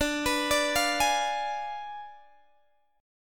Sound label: D Diminished 7th D, Guitar, Open G